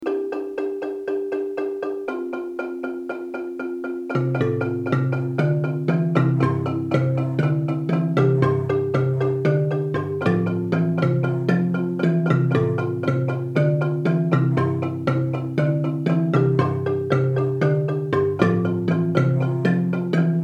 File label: bari, marimba; carnival